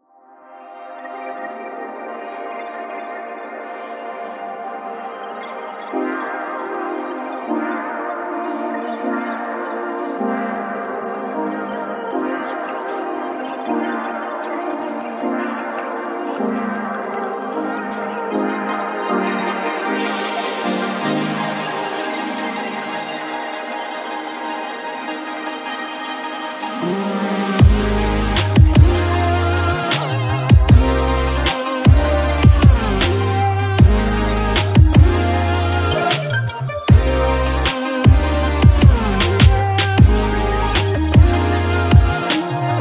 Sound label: future bass; FUTURE SERIES